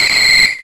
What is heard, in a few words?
Freeze Dry; pokemon reborn e19 2022